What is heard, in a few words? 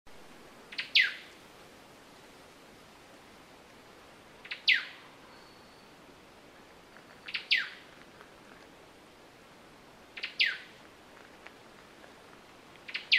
Poecilodryas Superciliosa; White-Browed Robin; Call